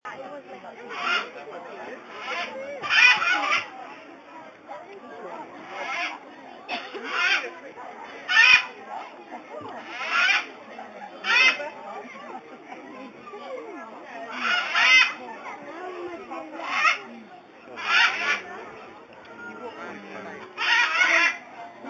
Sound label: noisy parrots; longleat